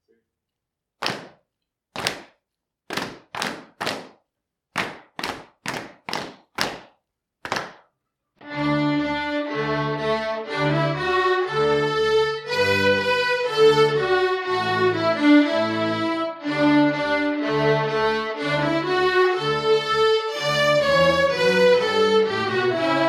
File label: D Scale; Marching